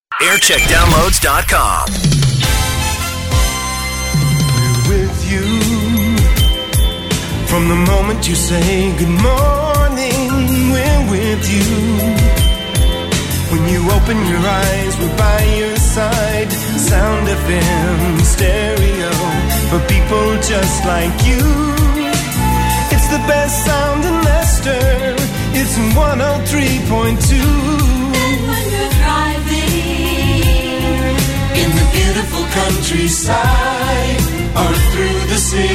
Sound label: Image Song; Sound FM